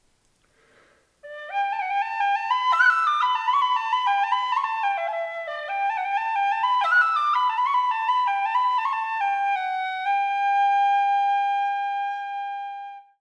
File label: whistle d